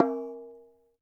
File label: CLAY, Cameroon; AKAI S6000 CD-ROM - WorldSounds; CAMEROON PRC